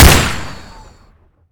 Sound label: gr9 fire, weapons